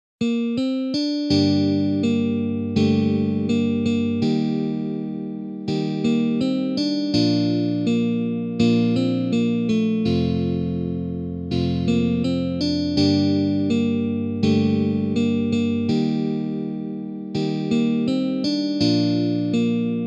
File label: Verse Slow Tempo, guitar